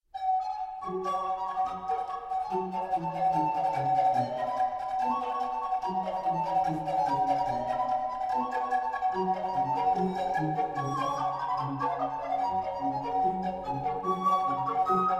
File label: blues fragment